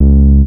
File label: Synth Upright C#, One Shot Bass